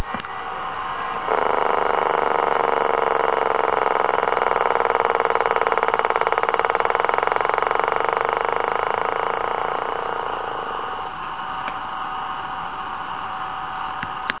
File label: turnoff